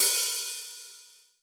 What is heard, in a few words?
open hihat, Zoom MRT-3B